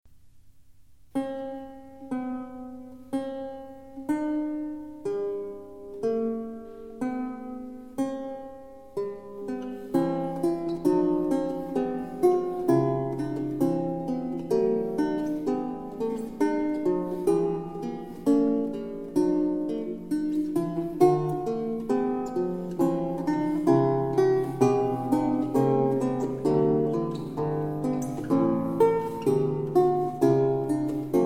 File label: Fuga in Eflat Major; Bach; BWV 998